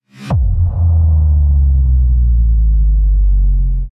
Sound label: Brutal Hit Downer; Ninja Tracks